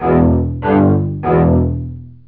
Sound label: tram bass